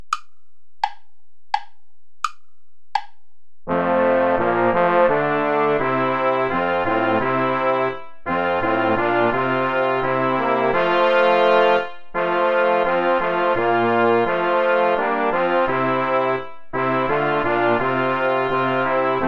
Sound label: play along without trumpet; lord sing with lips and heart